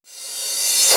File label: Reverse Crash, Cymbals; Vengeance Samples